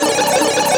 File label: Ping Pong loop; gamerip